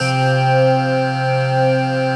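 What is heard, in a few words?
chord pad, Pads; Best Service; XX Large - Pads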